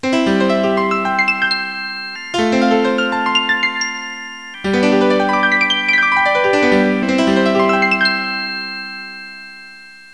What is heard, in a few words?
strum, qchord